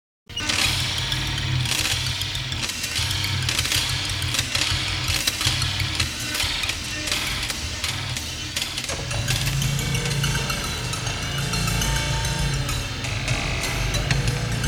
Installation With Violins Inserted Between The Strings